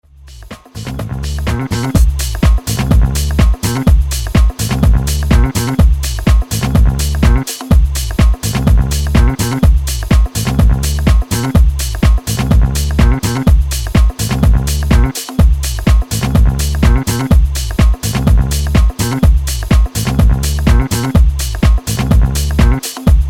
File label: Funk In Your Trunk DJ Tool